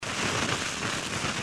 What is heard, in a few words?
volcano stone; Blackeye